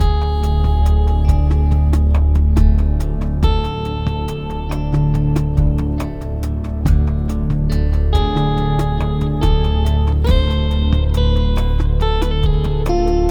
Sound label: Menu Loop; Legends of Dawn Reborn